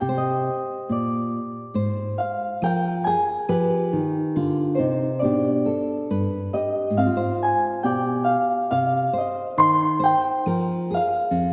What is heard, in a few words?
Harp Instrumental